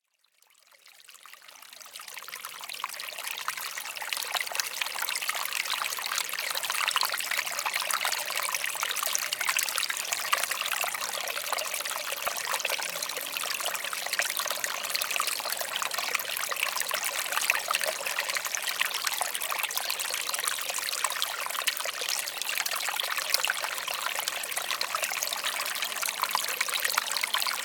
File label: stream; Kevin Durr; Essential Water Sounds Vol. 2